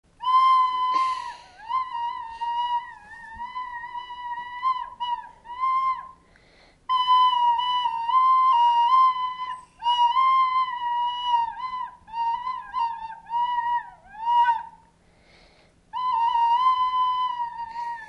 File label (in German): blockfloete ueben